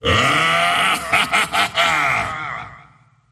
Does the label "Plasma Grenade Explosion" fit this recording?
No